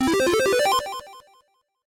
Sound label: extra life